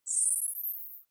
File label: navigation point